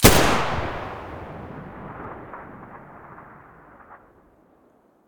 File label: muzzle g36 stereo, weapons